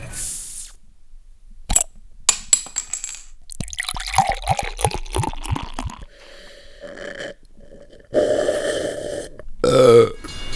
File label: Drinking Beer